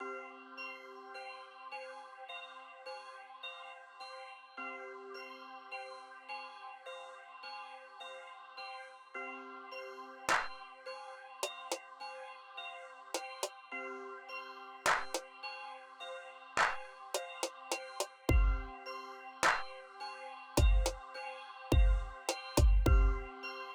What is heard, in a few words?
trap bells bass